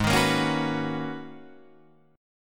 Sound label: Ab Minor 11th, Ab, Guitar, DADGAD